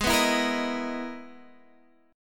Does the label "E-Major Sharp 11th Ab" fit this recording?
Yes